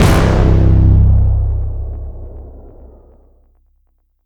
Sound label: Fail